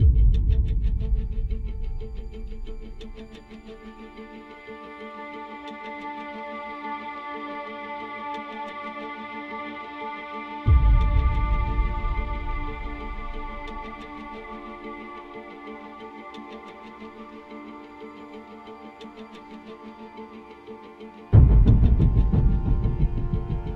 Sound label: Stripped Ambient Loop; Eisenhorn: Xenos